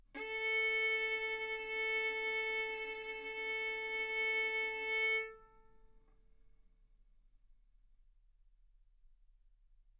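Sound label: Violin arco mf A string A4 B4 stereo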